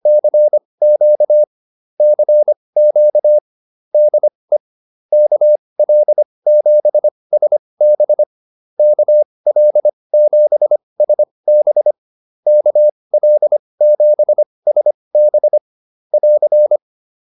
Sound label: QSO, intermediate practice files